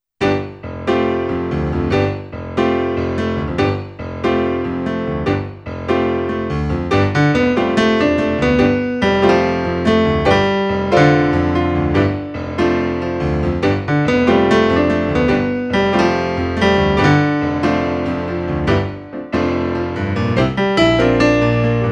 melody

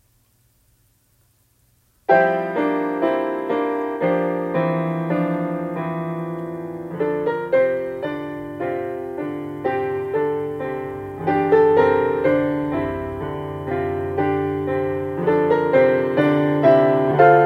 accompaniment

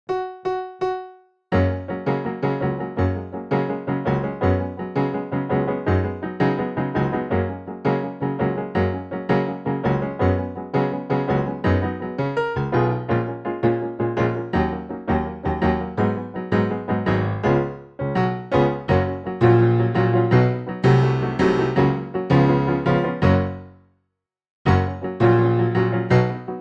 Backing Track

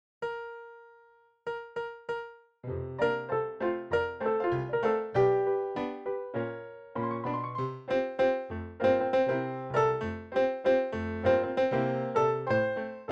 female presenting plunk track

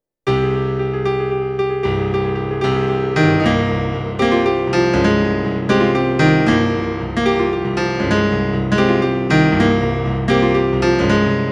Bass

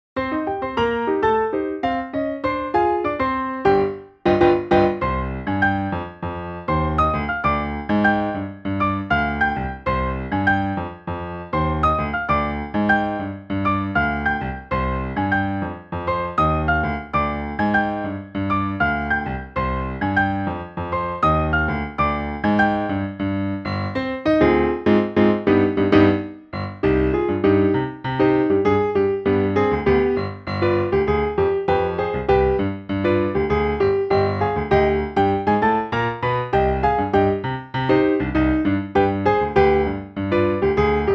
accomp

